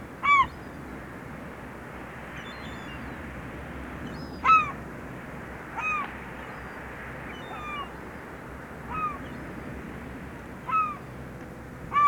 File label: Sea Birds Seagulls; Poetry Project